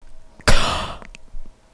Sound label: K Inhale; Exercises for Nasality